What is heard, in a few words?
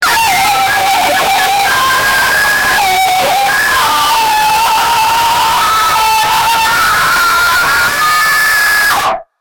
tormented screams from a, electronic music